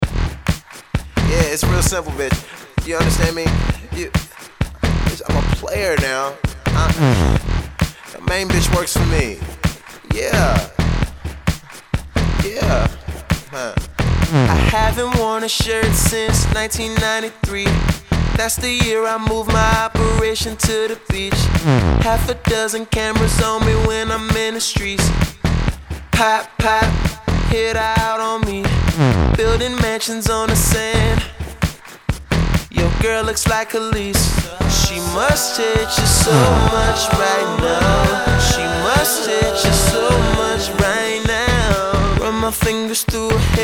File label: theme music